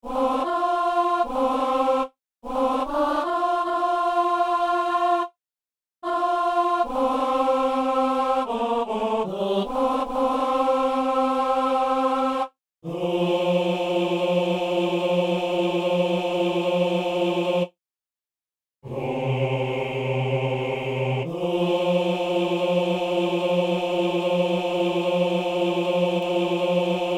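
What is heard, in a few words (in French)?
ténor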